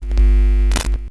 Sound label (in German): KNISTER, NOISE; Xsample VOL-9